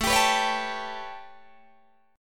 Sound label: A 9th A, Guitar Open D